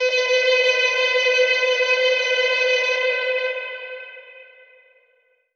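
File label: alarm siren, echo processed, 170bpm; musicradar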